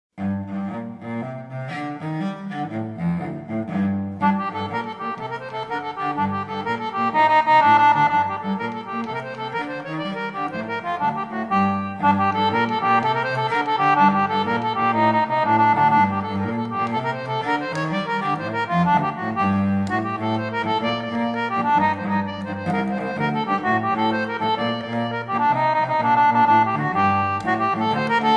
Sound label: concertina; The left side jig; English concertina